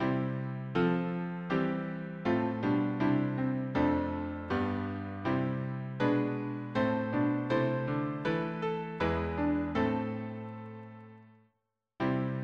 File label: harmony piano